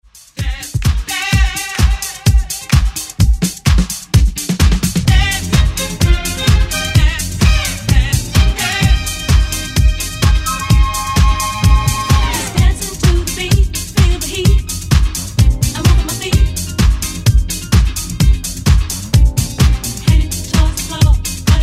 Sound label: Dance Disco Break